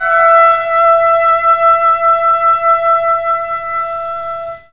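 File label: flute tone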